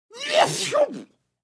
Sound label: Adult Comical Sneeze, male